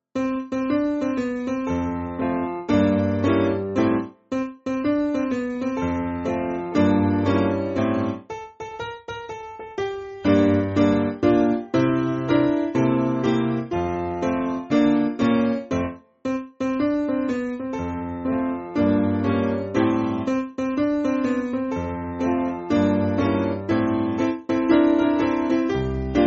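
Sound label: Piano, Backing Files for Churches; Ya Kamata Duk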